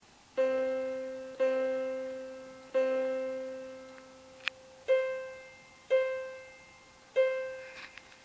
grave agudo

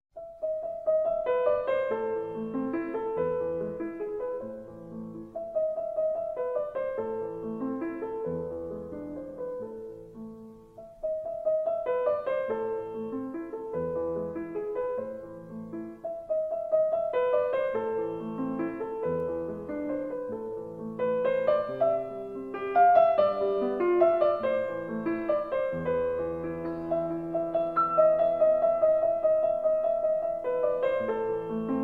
para elisa piano

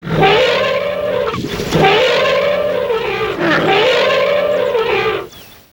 elefant

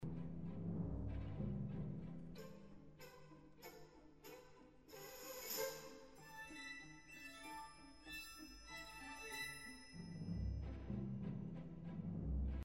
sonaja striang

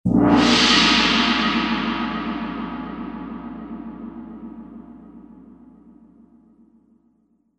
sonido largo

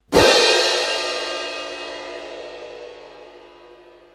platillos

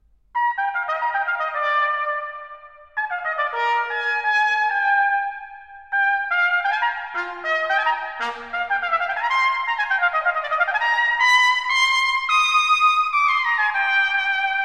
trompeta

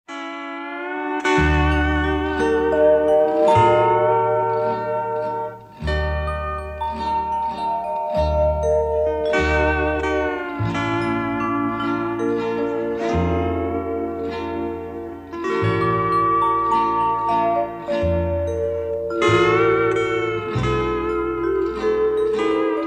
guitarra hawaina

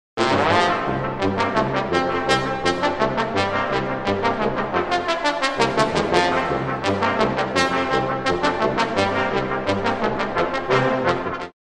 trombon

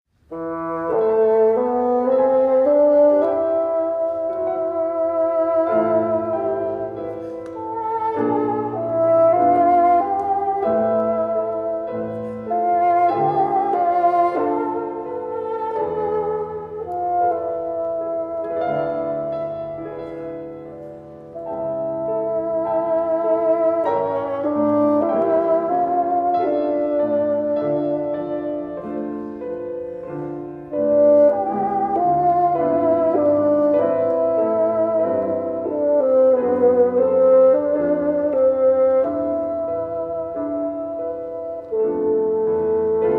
fagot

